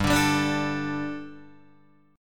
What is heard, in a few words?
G Suspended 2nd G, down, Guitar, DADGAD